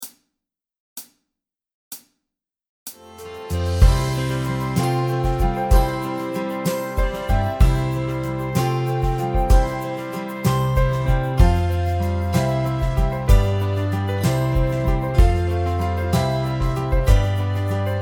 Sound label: Instrumental